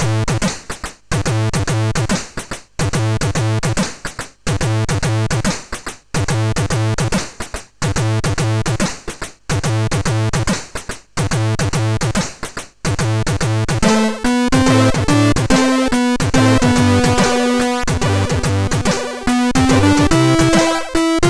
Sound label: Stumbling, MOS6581R4